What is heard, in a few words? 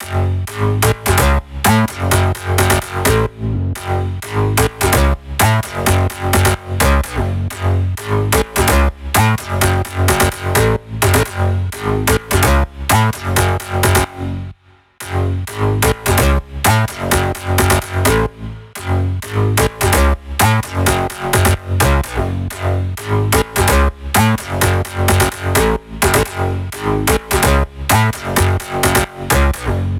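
128BPM Bass Full Processed Wet SC, F# minor; Demo Constr Kits; Let's Work It Kit; Bassline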